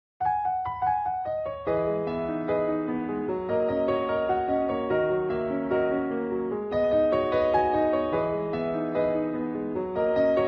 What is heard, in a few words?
piano ver.